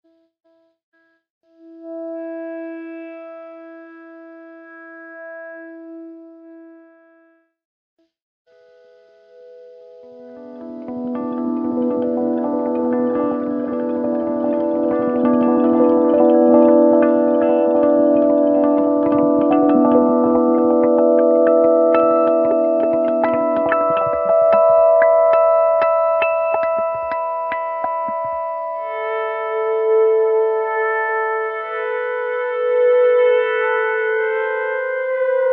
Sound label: G steel